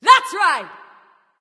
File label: nv yay; smite; awilix